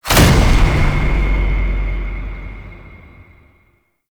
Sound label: Tenno Death State Enter; Warframe